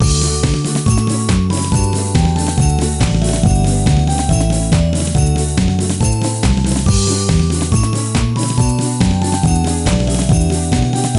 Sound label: BGM Menu; koisuru purin koi wa daibouken dr. kanmi no yabou; nds